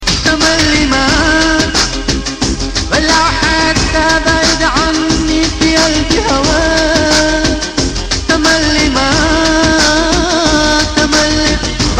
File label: arabic new mix